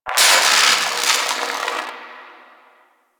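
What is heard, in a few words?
Distant Electricity; Ambience; Warframe; Corpus Gas City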